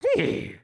Walk; Lego Strombie; build mode

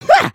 soccer; emotes

